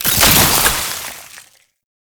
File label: Alchemy Grenade Pickup Cold; Entrati